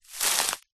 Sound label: footstep single grass thick dry; foley; zapsplat